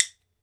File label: wood bone, Ireland; World Combos; E-MU Producer Series Vol. 7 – Old World Instruments